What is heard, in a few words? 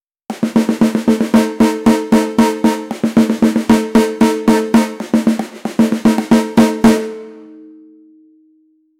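cheerful and energetic, fanfare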